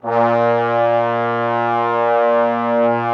A#1, Trombones; Best Service ProSamples vol.51 - Classic Orchestra 2

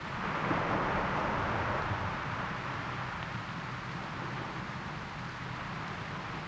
Beach Dark, Water; outdoor

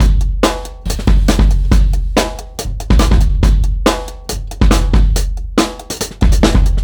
Bon Bon Bizzle, Breakbeats, Drum n Bass; KJ Sawka; Drum n Bass & Beyond

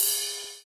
Ride; Equipped Music; Loungin House

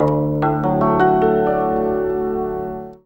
ZIMB HIT; Best Service; World Colours

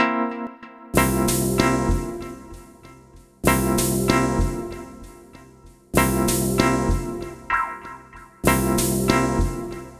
Acid Mod Kordz-C, lounge, 096; Zero-G Kocktail Kollection; Construction Kitz

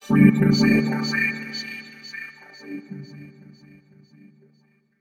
Frequency G2, Robotic Vox; Zero-G Club Techno

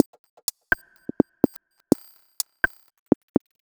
Minimal, 125 BPM, Percussion; Sonic Foundry (Sony Creative Software); Underground UK House & Electro

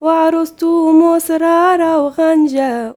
YOUNGIRL, Arabian Traditions; USB Soundscan vol.43; AKAI

